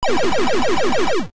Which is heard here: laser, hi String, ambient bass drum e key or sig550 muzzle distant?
laser